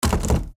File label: door locked